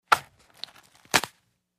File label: Detergent open box